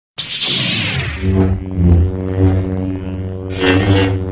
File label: light saber; ringtones